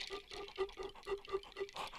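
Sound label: Music Village Calm Near; From Dust; PS3; Xbox 360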